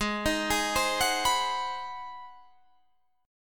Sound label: G sharp 7th Sharp 9th G sharp, down, Guitar, Standard; G#7#9; arpeggiated; JGuitar